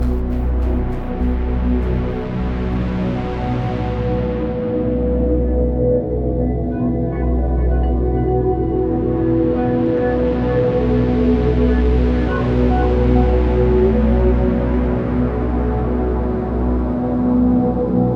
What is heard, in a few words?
Darkest Loop; Face It - A Game to Fight Inner Demons